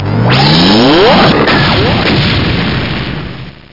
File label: neutron cannon